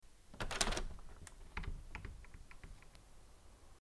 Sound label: hopper window opening